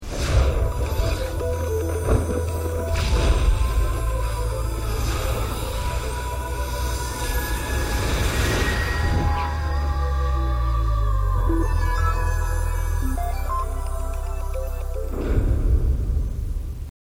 jingle

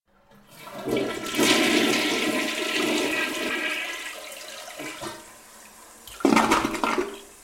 toilet flush

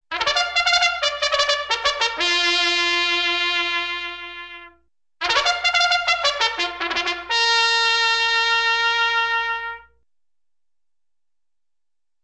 trumpet first call

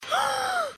gasp

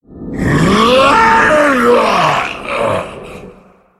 death sound; xerath; gmod source

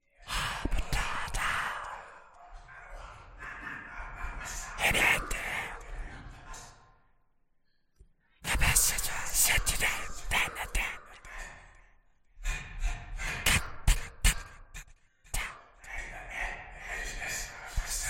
soundscapes

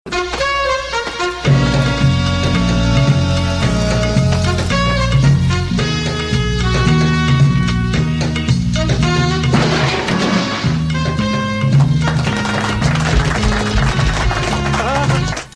blue oyster gay bar music; police academy

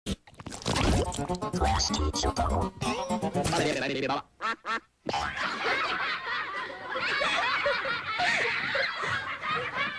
robot; klasky csupo; nickelodeon 2004

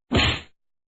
punch; adobe flash

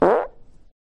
girl fart

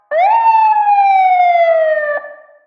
philippine national police manual siren

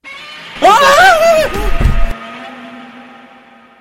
roar scare

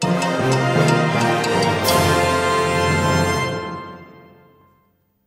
news flash

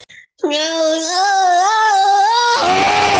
orgasmous brrrrrr raaaah

